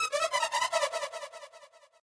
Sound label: Echoes